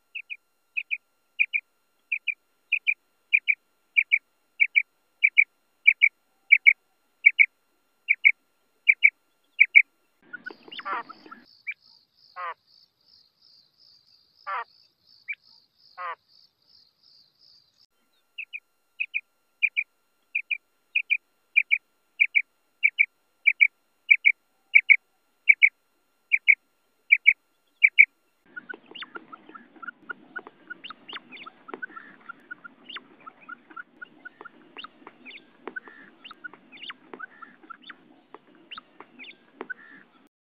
female quail call, female quail sound; madi batair ki awaz

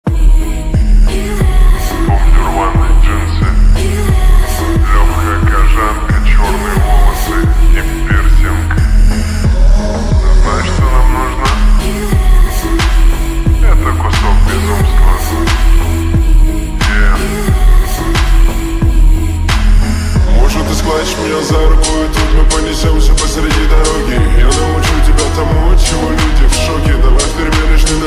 slowed and reverb

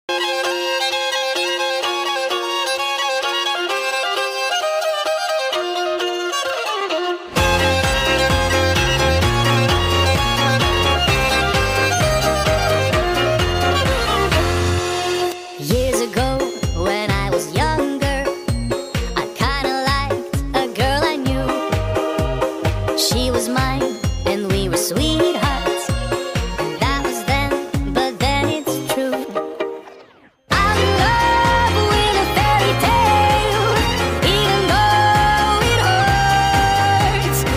sped up

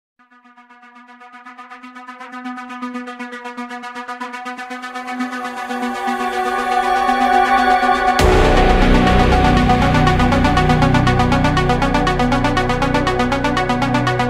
boss fight music; ice scream 8 update

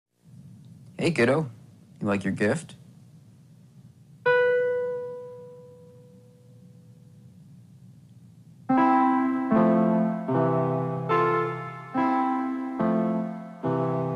piano

